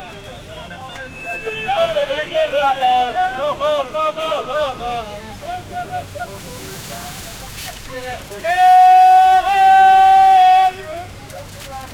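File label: chennai kasimedu fish market, water splashing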